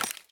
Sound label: launcher drop; Escape from Tarkov